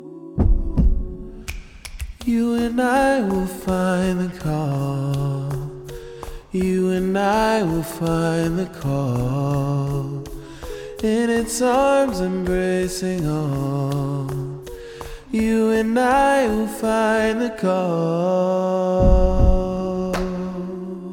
The Call practice track baritone

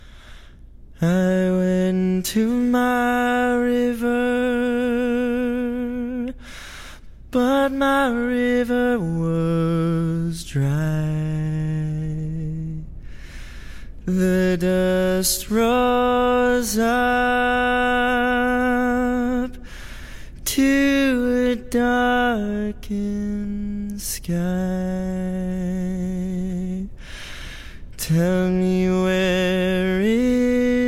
practice track tenor

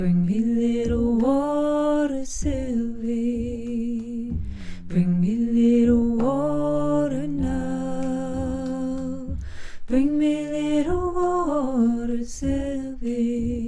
SATBB ALTO

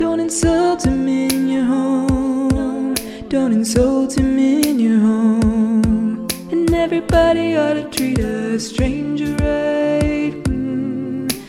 Sop Solo

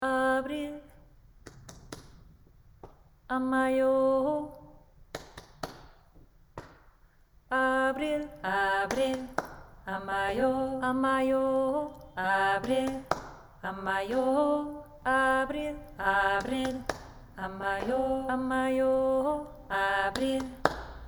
Audience Loops

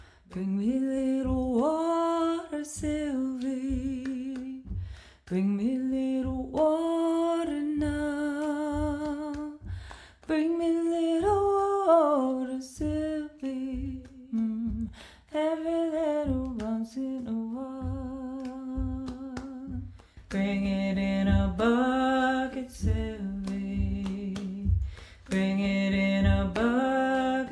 tenor practice

